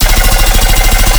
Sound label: Bass Machinegun 200 C#; exciplex sample presets pack; JinoBeats